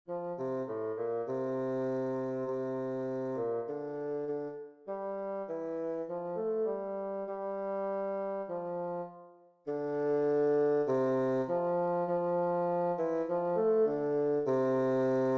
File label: grade c bass